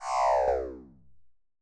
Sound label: Cry